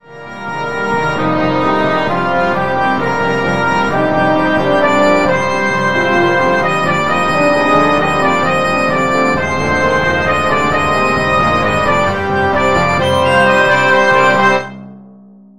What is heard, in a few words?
Wind Ensemble